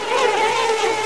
bee swarm

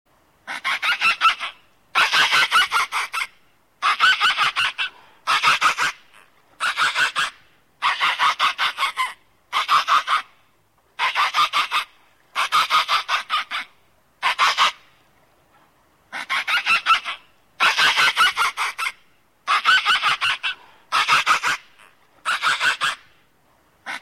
animal sounds whale

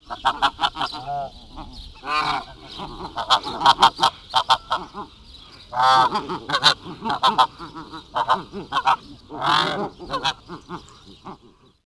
Snow Goose; DKMM Nature

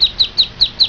chicks

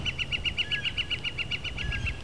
Northern Flicker

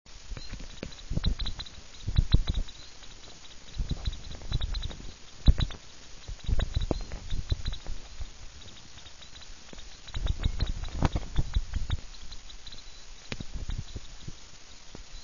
degu

